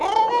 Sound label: gurp; quake2; monkey